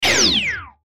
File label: Mouseover Hi-Tech Laser Shot; Multimedia; Blastwave